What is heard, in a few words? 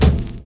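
BOND bass drum; Univers Sons - Kitch 70s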